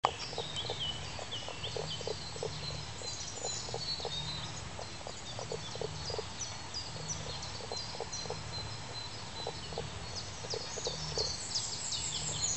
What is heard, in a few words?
Pelobates fuscus